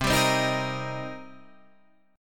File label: C Minor 7th, Guitar, Half Step Down